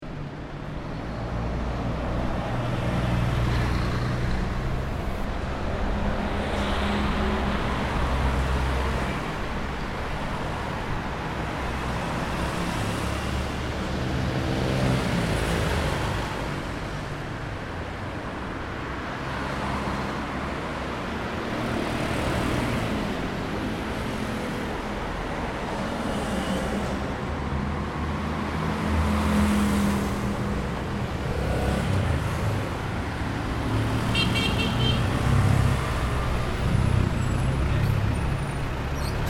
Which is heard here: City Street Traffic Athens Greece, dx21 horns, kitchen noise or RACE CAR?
City Street Traffic Athens Greece